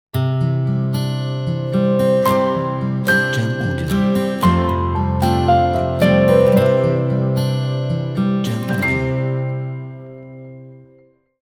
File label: Inspiring Guitar Logo